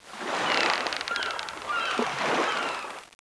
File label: WHARF; Caesar III